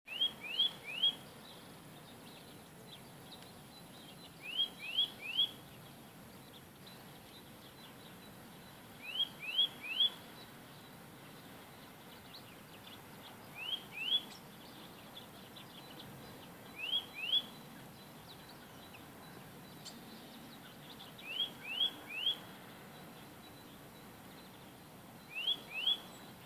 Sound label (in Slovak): Brhlík tui tui